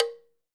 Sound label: Krin - A#; percussion; Cymatics - Infinity Beta Pack 2.0; Zeus Percussion Collection